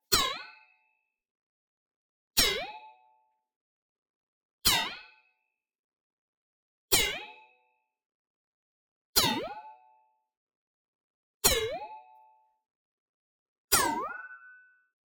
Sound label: bling, sword echo; boom library; Anime Essentials; DSGNMisc